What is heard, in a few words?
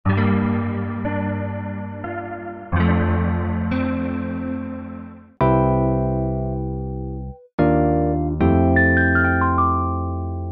Sixties Seventies